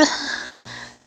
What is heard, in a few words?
Grunt; Lewd SFX